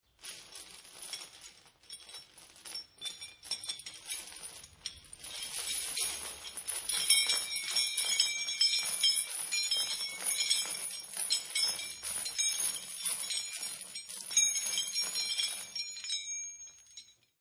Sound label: Chains Moving, Several heavy chains being moved, shaken, rattling and clanking into each other; Jangling Sound Effects